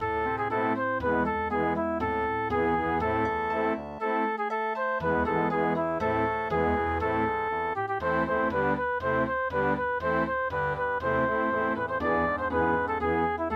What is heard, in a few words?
Vienna Waltz; Christmas Dance